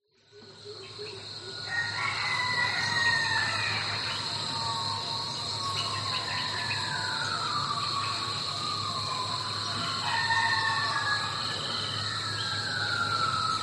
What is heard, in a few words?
nature rural amb birds insects chickens gibbons distant edge rainforest vietnam